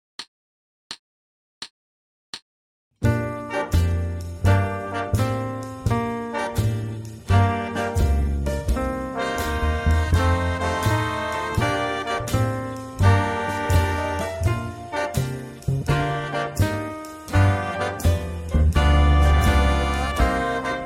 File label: 84 bpm